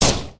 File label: High Power Bullet Fire; gamerip; blastmorph pinball